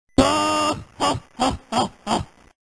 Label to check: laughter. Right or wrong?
Right